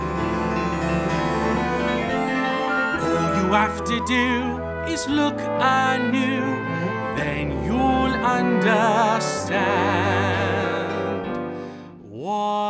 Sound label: with Vocals